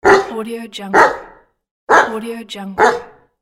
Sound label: dog